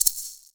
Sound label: Maraca; Polyend; Simulacrum Sounds; 1 Shots; Acoustic and Electric; Drums